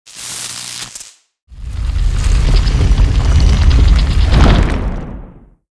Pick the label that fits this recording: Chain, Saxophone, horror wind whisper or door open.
door open